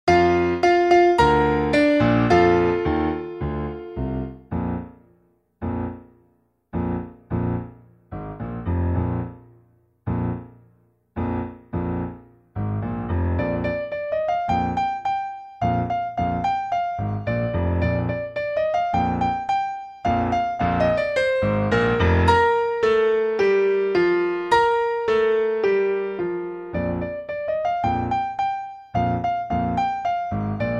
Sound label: Drummed Out